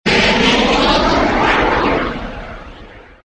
rocket take off small; transport

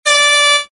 little toot

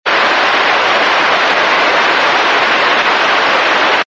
comm cb radio steady static

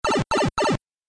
shrink

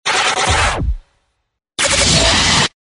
comm static groove

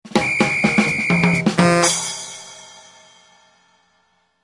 Comedy Jam Cartoon Music Alert

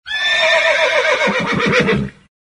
horse whinny with snort

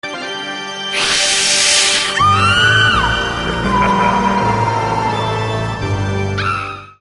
Creepy And Scary Alert Tone

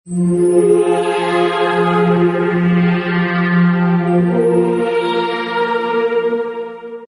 chord high ethereal

